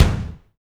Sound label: K, Drums; AC-PWR; NATUR. K1